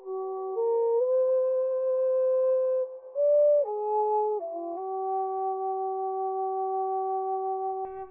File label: suling